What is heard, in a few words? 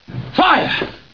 fire; Schuetzenchor